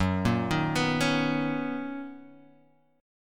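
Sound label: B Major 9th G flat, chord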